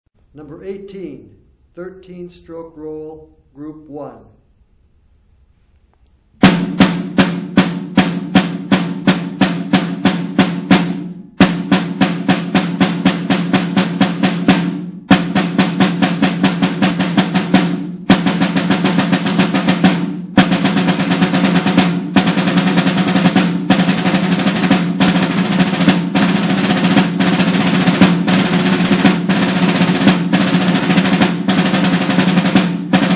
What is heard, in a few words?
snare rudiment